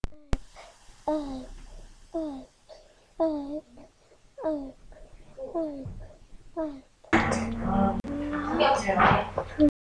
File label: harp